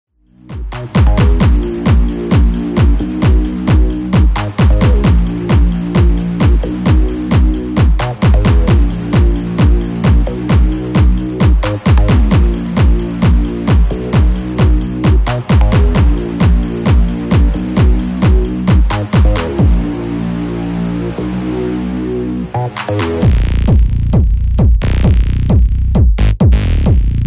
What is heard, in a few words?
Gritty Bass; Wave